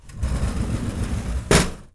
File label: class door